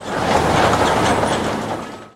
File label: ambient farmyard combine harvester pass by; talking tom gold run gamerip 2016